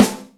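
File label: Big Wood Rim, Yamaha DTXpress Acoustic Snare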